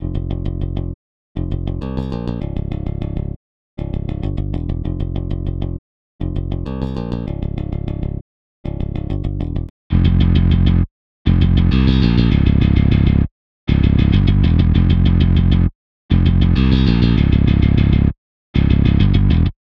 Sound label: harley benton enhanced mj-4mn